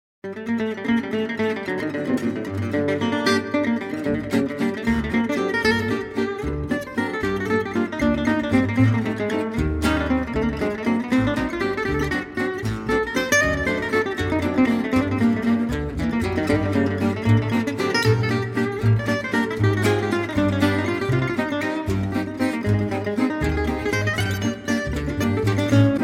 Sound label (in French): Valse